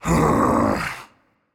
sigh, voicepack; smite; jorm

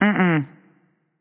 nv disagree; smite; awilix

